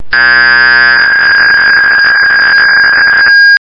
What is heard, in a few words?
PSK125